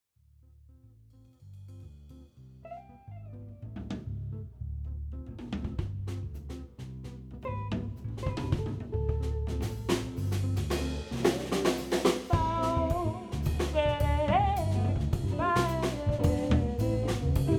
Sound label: Jazz Band; Atlanta